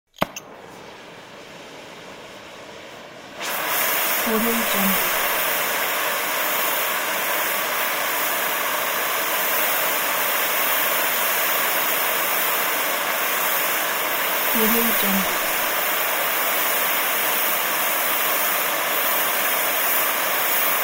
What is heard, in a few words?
blowtorch